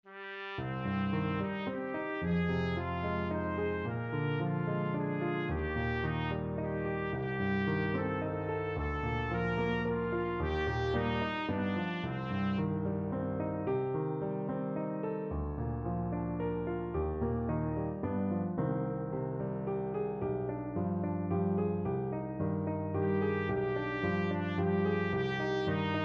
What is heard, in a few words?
trumpet